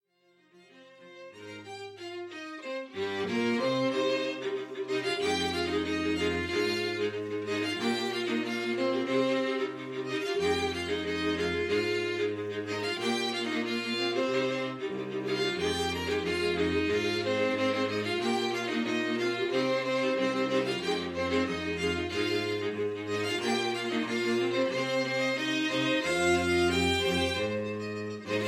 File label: strings